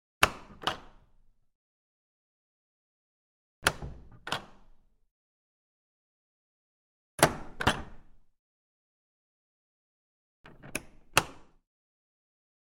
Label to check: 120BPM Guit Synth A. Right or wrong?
Wrong